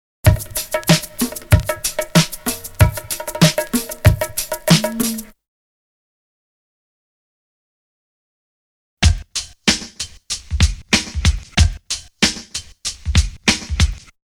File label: Drum loop; BEST OF DRUM BREAKS 30